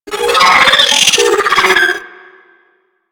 Vocal Reboot Reactivate, Enemies; Warframe; Riot Moa

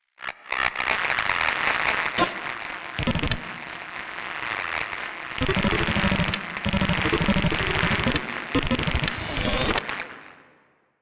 Boot Up Glitch, UI; Warframe

